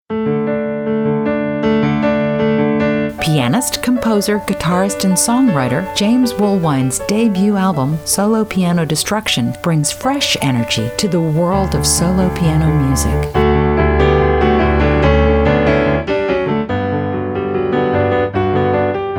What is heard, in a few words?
Solo Piano